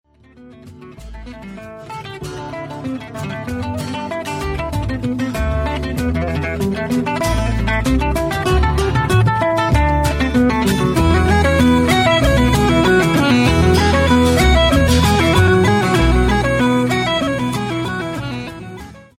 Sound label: horsetrot, guitar